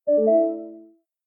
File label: dialog warning; stereo; kde; ocean